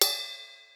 crash, Millenium MPS-400

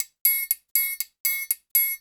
120 TRIANG; Best Service Advanced Media Trax Modern Composer

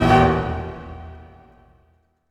HIT, Orchestral; Orchestral Loops; 128 MANHATTAN

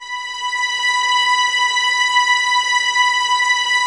Violins B 5, String Ensembles; Miroslav Vitous; 11 Violins

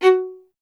STR VLA, Solo Instruments

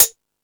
High Hat, Drum Machines E-MU Pro-Cussion

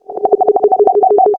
Ambi Bubble; Zero-G Jungle Warfare 1, 2 & 3; Ambient

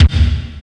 base drum; DRUMS

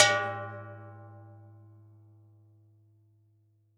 low bell; Univers Sons - Raricussions

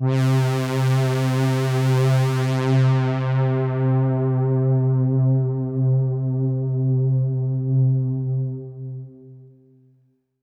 Pad C, Synth Prophet One Shots; Electronisounds

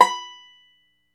FLATTOP, Flat Top Banjo

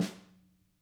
SNAR SFT, Interactive Drum Kits; Sonic Reality; ID SNARE 1+

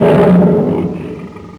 cat, Yamaha DD-8

